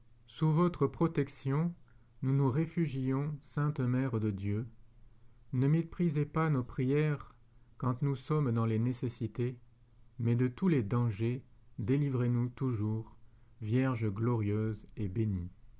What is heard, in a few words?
sub tuum fr; preces